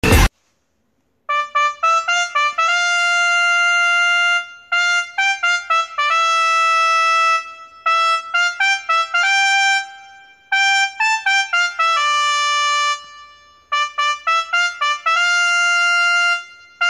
keyboard notes; sivakarthikeyan namma veetu pillai

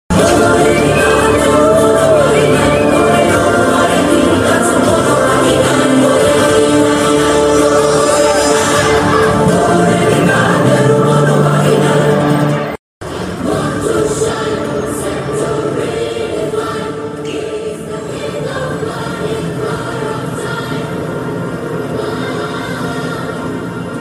interval bgm ringtone; game changer interval bgm

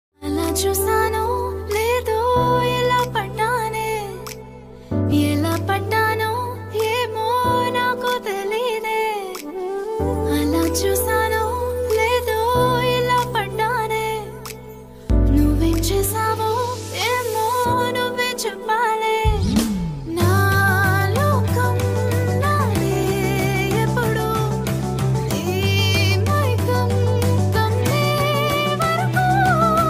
song female version; chin2creations

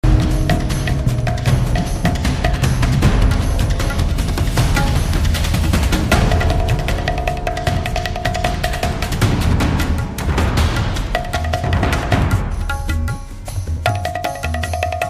bhakti ringtone; raghunandana; hanuman movie ringtone; hanuman ji ringtone

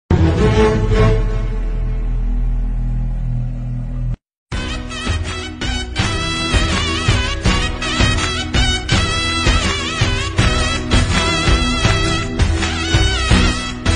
bgm love ringtone tamil ringtone bgm

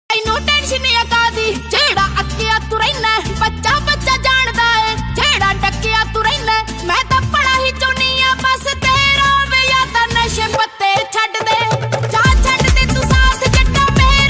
new punjabi song

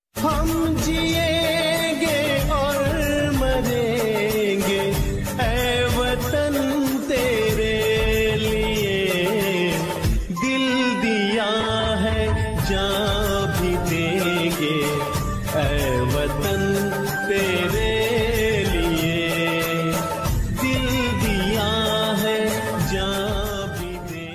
desh bhakti ringtone

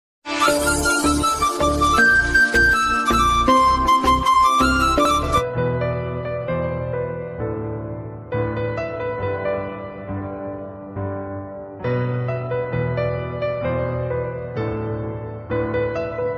new emotional instrumental ringtone

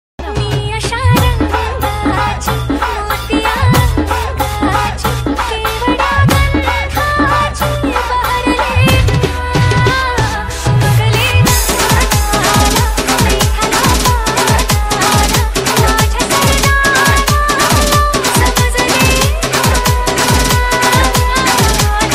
marathi romantic ringtone

